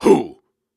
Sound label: Grunts and Chants, Viking Vocal